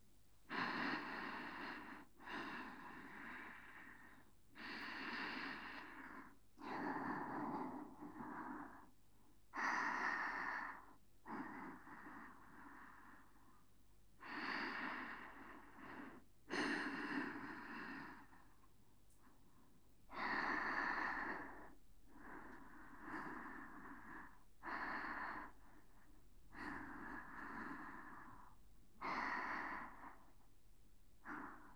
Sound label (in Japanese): 寝息